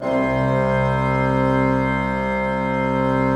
PLENO C2, Cathedral Organ; Propeller Island

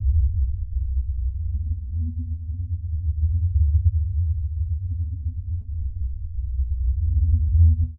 Rumble, cinematic drama soundbeds; musicradar

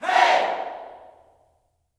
HEY, Gospel, YELL SHOUT; Spectrasonics; Vocal Planet

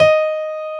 WS GRAND D#4; Analogon

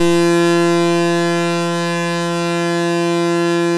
EMU MODULAR, modular syn

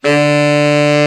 BRA TENOR, SAX; Best Service Gigapack 1; BR SAX 4

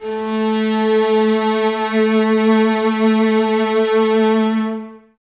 violas A 3, String Ensembles, Exprsive; Miroslav Vitous

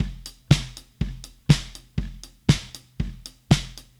Drums, 70s Analog Funk; Sonic Foundry (Sony Creative Software); What it Is!; Classic Caddy

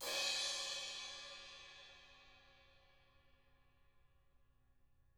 ZILDP CRASH

